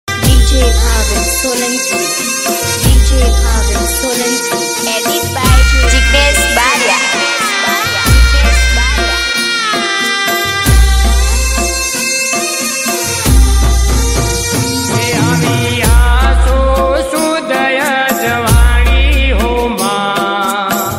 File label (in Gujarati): gujarati garba; navratri 2022